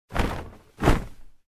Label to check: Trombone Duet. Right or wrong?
Wrong